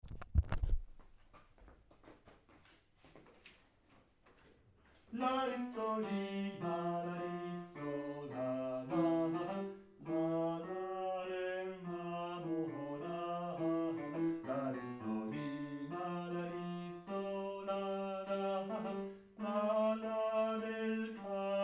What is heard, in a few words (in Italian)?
baritoni; el careter